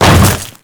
Hammer Boss Step Ice, Footsteps; Warframe; Grineer Hammer Boss

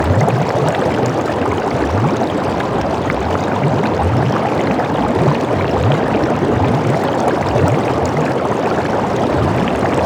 Ocean Big Bubble Loop; Ambience; Props; Warframe; Grineer Ocean

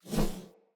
Short Sword Charge Whoosh; Weapons; Player

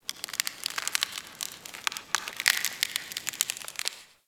Ice Creak Echo; Warframe

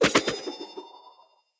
Throwing Knives Projectile; Pothia

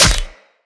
Sword Impact Flesh; Weapon Impacts; Short Sword; Combat; Local Player

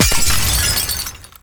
Glass Ring Partially Destroyed; Warframe

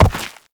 Step Dirt; Warframe; Uriel

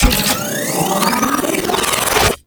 Charge Up, Weapons; Crp BFG

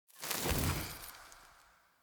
Fire Blade End; Weapons; Enhancements; Elemental

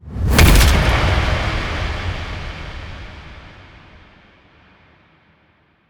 Helmet Drop; Warframe; Cinematics; Apostasy

